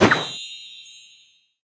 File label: Hintbox Appear